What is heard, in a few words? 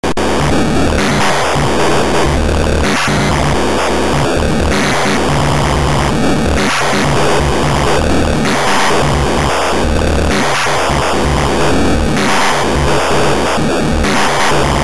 WaveNoise, lsdj